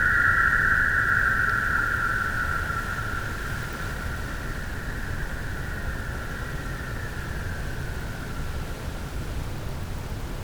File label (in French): cachalot negatif, Physeter Macrocephalus